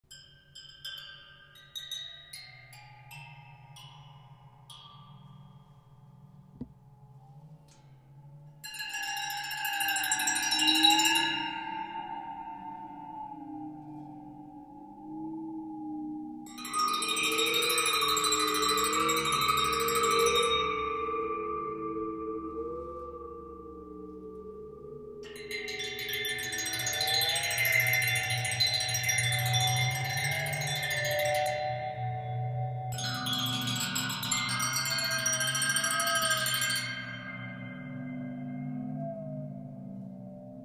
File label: Waterphone draht